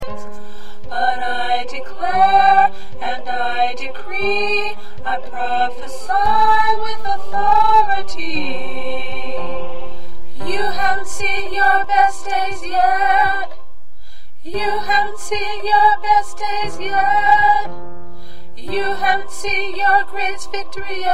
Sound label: Alto, choir